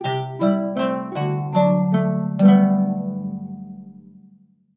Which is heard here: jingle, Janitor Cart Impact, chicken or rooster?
jingle